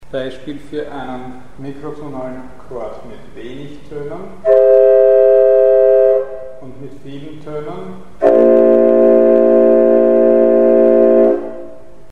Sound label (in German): Vielstimmiger Mikroton-Akkord; Mikrobuch